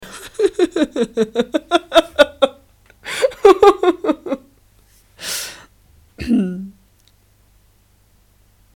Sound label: laughter clearing voice